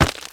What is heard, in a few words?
dirt hit; teardown; ps5 windows xbox series xs